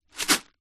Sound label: fuse beads in plastic tub movement shake